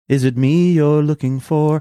singing is it me you're looking for

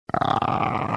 drawn out ahhh

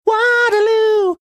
singing

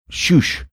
shoosh

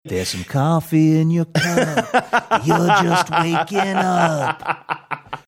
singing jingle from coffee advertisement

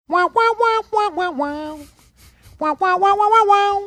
singing string of wah wahs

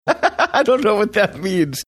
laughing saying i don't know what that means

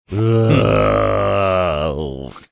disgusted groan

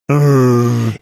mimicking disapproval sound

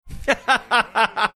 laughing

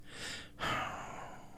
sighing

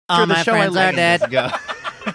singing all my friends are dead, funny

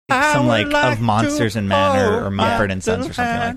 singing i would like to hold my little hand

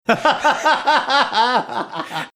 laughing

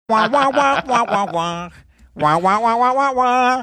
singing string of wah wahs

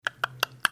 clicking his tongue

